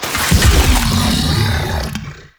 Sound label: Pit Object Orb Charge Fire; Warframe; Infested